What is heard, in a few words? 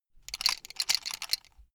buckle plastic metal rattle short fast